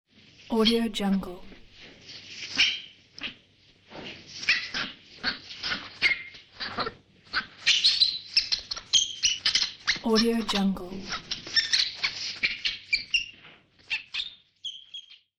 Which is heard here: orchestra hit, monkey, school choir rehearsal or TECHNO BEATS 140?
monkey